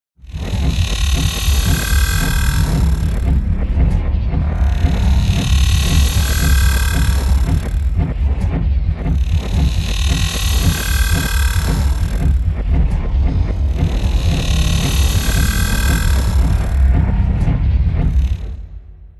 robotic power surges sci fi robotic robot cyber futuristic energy machine drone